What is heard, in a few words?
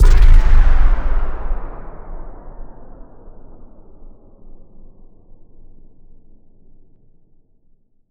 Impact; impact samples